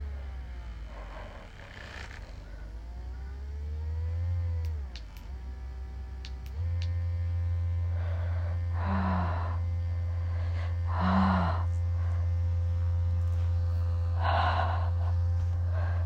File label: White Noise